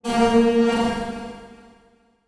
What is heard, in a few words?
Transition Metallic Warble